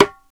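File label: perc darabuka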